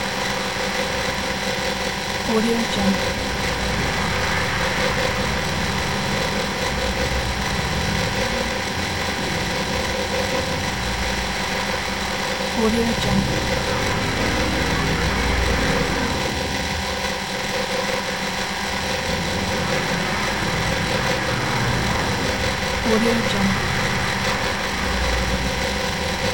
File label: static wormhole glitch